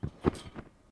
trampoline realistic

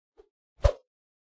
meleeattack swoosh light, weapon swooshes; psfx

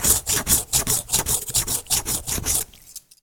saw ice; sonniss gdc

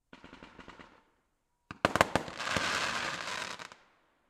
fireworks multi close crackle; Sonniss GDC

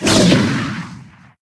Axe swing; lancer weapon fx

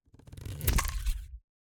arrow flies bow and arrow shoot; Sonniss GDC

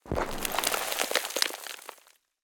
debris falling rock dust; bluezone; sonniss gdc

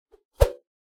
melee attack swoosh light; weapon swooshes; psfx

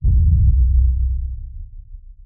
echo hit pan subsonic; pro sound effect; Sonniss GDC

